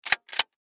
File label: Shotgun Rack, 16, Gauge, Slide; Warfare, Gun, Shotgun; Conn, Arms; BlastWave